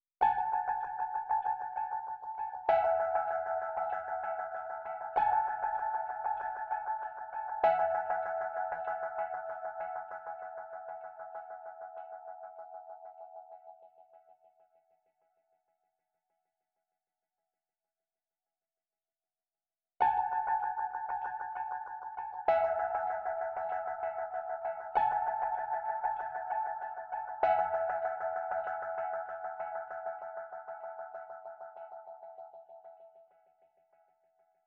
97 BPM F# Min E Piano, West Coast; Loop Stems; Melodies; Cymatics; GHOST (Beta Pack); Alley

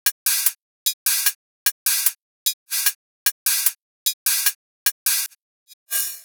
Dubstep Drum Loop Cymbals, 150bpm; Stem Seperated

